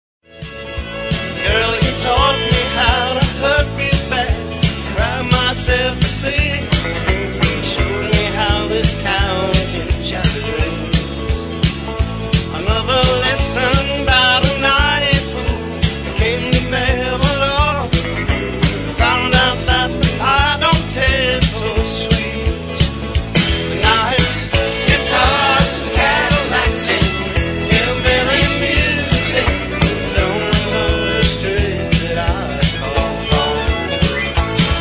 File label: Guitars